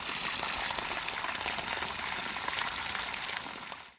Tap Water

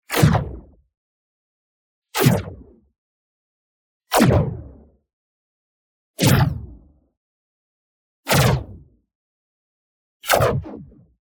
IMPACT SMALL Flangy Blast; boom library; Matter

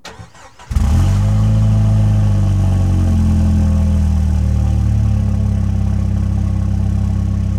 Jeep Wrangler Start Idle Loop; Trucks

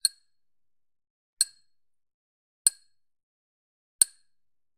Generic Click Metal Tiny

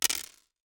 DICE on Hard Wood, Throw and Roll, Mini, Six Dice; Samples; ArticulatedSounds; DICE Sfx Party (Extended)

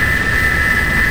Rocket Missile Loop; Weapons

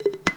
Candle Insert Metal Holder; SFX Kit; Foley - Misc

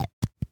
Test Tube Close; Medical; Blastwave

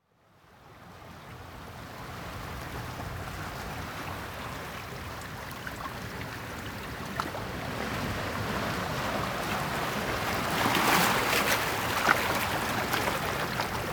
sea riffs; best service Blue Box; sea ocean

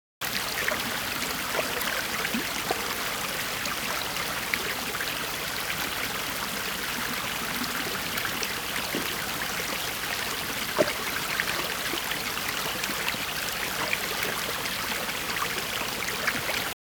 water stream medium; best service Blue Box; water streams

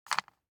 DICE on Wooden Tray, Grab, Standard, 4 Four Dice; Samples; ArticulatedSounds; DICE Sfx Party (Extended)

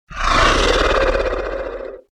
Dinosaur - Monster Opens Mouth; Hollywood Edge; sound designer's toolkit v2

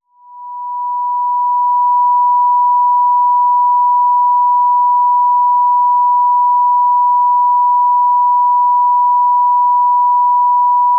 Chimes; Quiet Rooms & Other Worlds

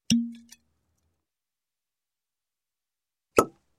Bottle, Wine Cork Pop; Sound Ideas 2000 Series

